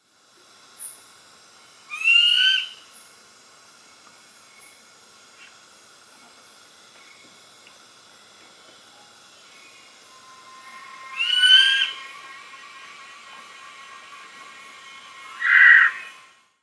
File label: Buffy Fish Owl Ketupa ketupu ketupu, buffy fish owl call